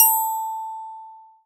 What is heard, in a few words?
A5 bell